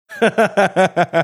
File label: chuckling